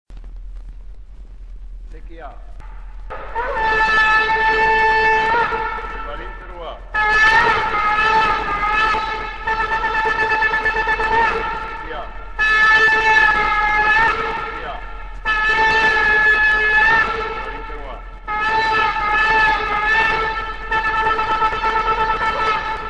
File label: Shofar Blowing